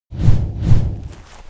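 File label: Mob attack; SubBossmob; 욱일승천류; 가토; 2단계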